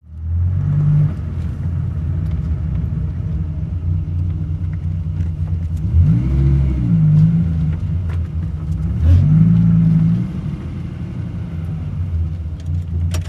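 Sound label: Accelerate Forward Gear, sports car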